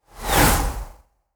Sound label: swoosh